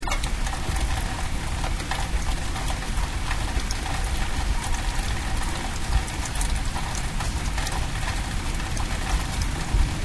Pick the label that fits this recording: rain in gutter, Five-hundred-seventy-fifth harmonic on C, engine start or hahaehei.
rain in gutter